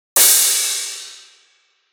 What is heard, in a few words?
crash